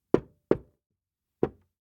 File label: Castling Movements, Chess; 344 Audio; Ultimate Chess SFX